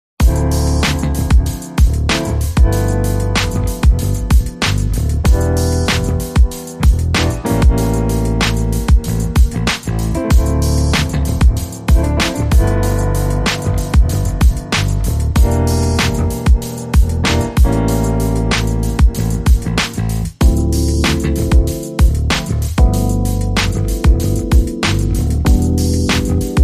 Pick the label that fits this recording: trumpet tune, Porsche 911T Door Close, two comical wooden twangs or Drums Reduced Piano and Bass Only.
Drums Reduced Piano and Bass Only